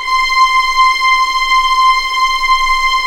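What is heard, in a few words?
C6 legato piano, violins; East West; The Ultimate String Collection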